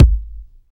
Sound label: verby kick sound A key; kick drum samples